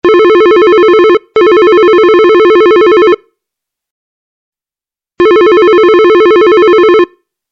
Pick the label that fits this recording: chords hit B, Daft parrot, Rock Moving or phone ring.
phone ring